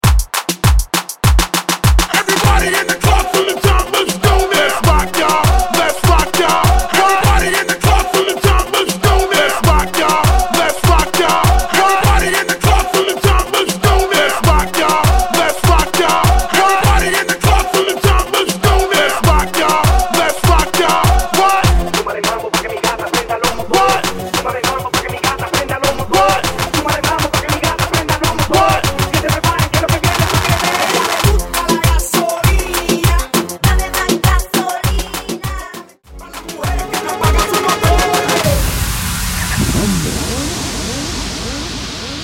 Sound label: transition breaker hype 100 130 bpm